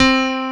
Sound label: CP70 C3, E.Piano; E-MU Producer Series Vol. 2 – More Studio Essentials; CP'd E.Piano